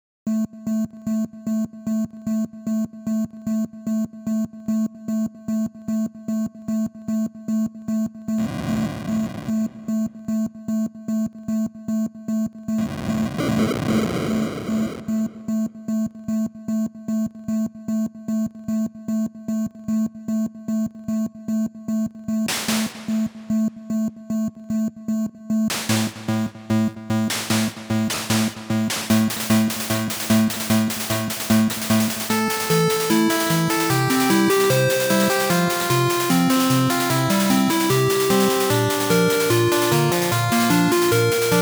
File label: Opening (8-bit); sengoku efuda yuugi hototogisu ran original soundtrack 2008